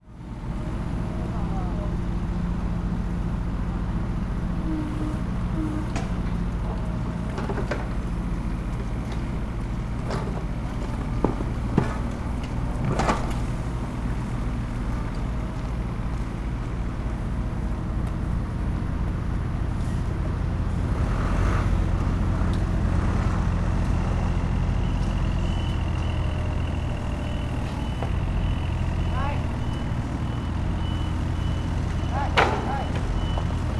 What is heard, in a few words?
decontamination